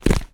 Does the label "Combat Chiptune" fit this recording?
No